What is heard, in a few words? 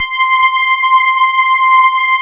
electric pianos; Wizoo Electric Pianos